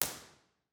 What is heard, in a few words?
theatre bformat IR; Individual IR Takes; OPENAIR